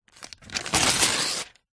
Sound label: household book telephone directory page rip out; zapsplat